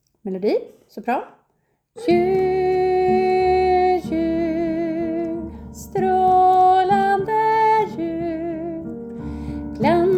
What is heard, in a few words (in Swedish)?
sop, seniorkor; korsang